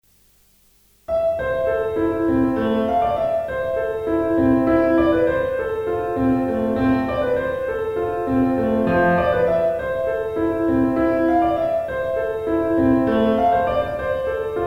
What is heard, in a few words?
piano pop